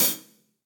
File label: Hihat Closed Studio Basic; Drum Hits; Session Drums Studio; MPE Hits